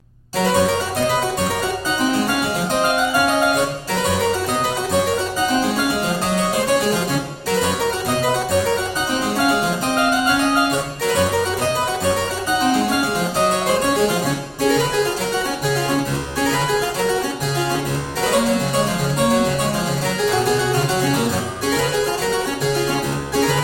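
Partita a Cembalo Solo Gigue a l'Angloise, Harpsichord; Telemann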